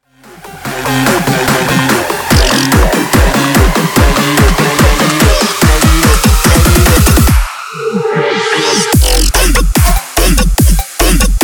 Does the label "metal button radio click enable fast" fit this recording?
No